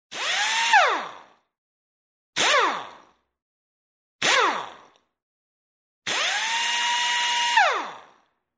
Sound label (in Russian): zvuki shinomontazha